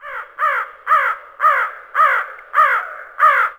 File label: crow